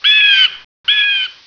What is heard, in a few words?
arctic eagle; de port arctic